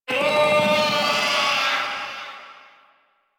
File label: Feral Vocal Spawn; Warframe; Catbrow